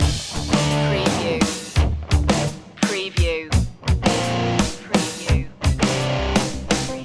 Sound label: 8bit rocktronic loop